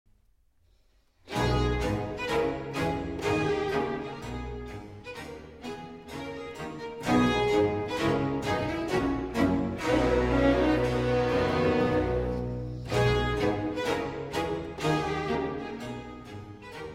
Overture in B Minor, Polonaise. Moderato e staccato; Overture No. 2; BWV 1067